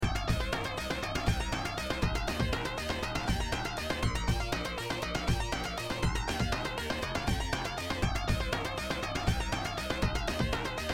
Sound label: bass basstone lead sound beat